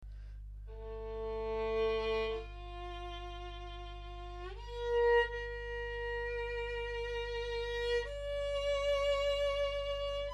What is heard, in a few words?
violin